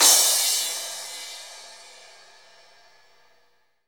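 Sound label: CYM CRA, crash cymbal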